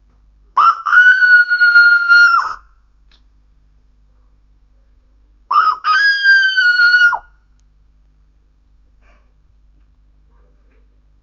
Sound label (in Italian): 50 tigri e gorilla